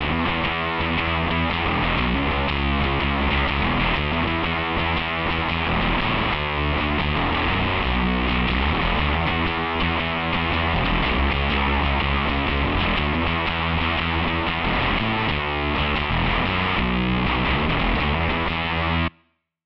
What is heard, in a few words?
bass octave